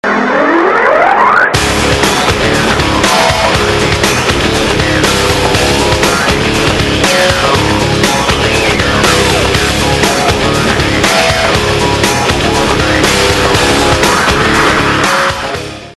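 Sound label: warp, ringtones; Motorola; Q9c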